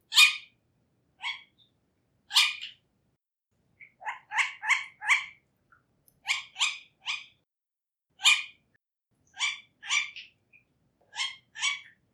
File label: bird tawny owl female; Ambience; beak bird